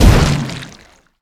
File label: Missile Explosion; Ravager